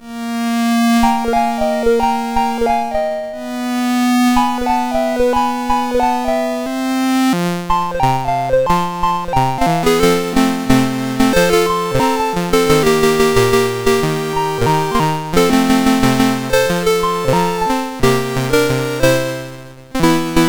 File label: Whistle Song, MOS6581R2; Nick Zelinsky